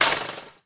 wall fence; Golden Crayon Sounds

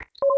Container Touch

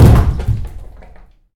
bathtub fall; Golden Crayon Sounds

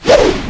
Screen Change; ESAN VO Sound Schemes; ESAN MK Sound Packs

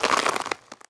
rubble step; Golden Crayon Sounds

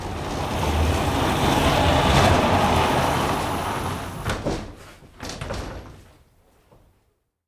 door close; Golden Crayon Sounds

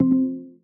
Siri Activate; AirPods Sounds; Apple Intelligence

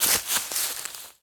leaves land; Golden Crayon Sounds

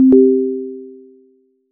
In App Notification; Skype Sounds

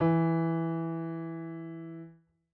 piano e; Golden Crayon Sounds

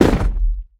carpet fall; Golden Crayon Sounds

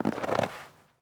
snow step; Golden Crayon Sounds

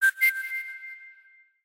winning score; RS Games Sounds

